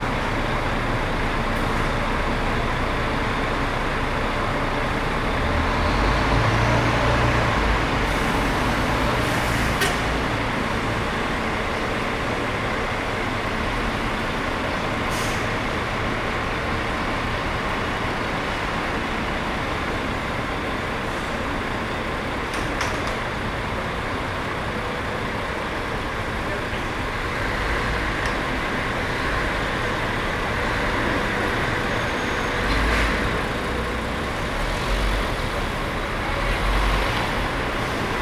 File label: ambience bus station terminal near busses; sound effects one